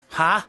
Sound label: huh